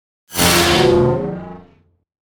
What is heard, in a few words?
Logo Shine Feedback Whoosh; Sonic Logo; Production Element Imaging Element Accent Transition; Blastwave